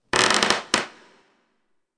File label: CRAK